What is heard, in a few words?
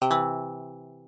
balloon Pickup C; phet io wrapper sonification; balloons and static electricity